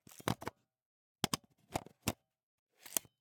Camera, Pentax KS2, DSLR, mechanical, removing lens, replacing lens, 3 moves, XY; Eiravaein Works; Vaeyan IV